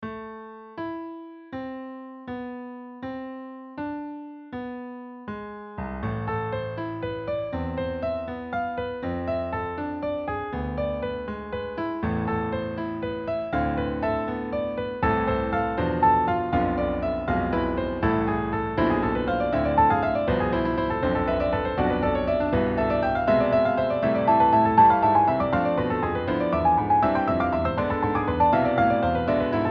canon

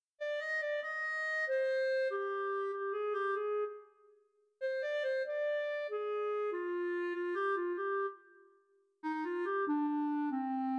Soliloquy for Clarinet

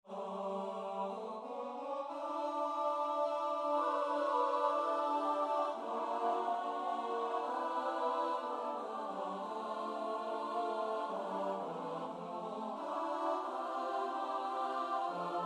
kyrie setting

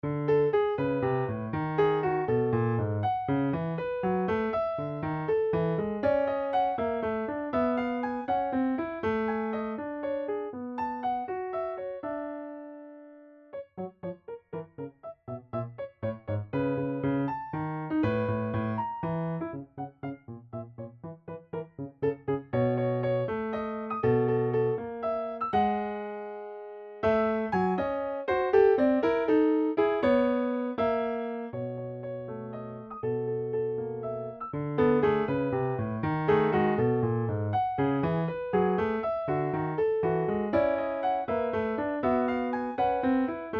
Contrapunto Disonante

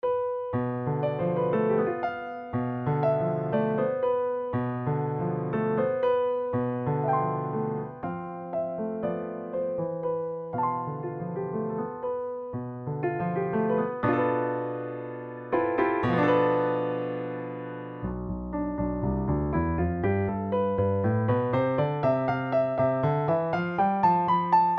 Modo locrio

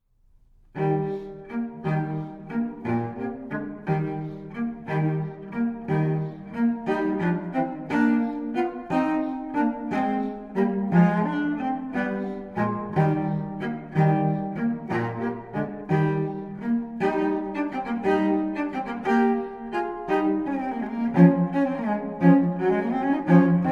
Suite For Solo Violoncello Gigue

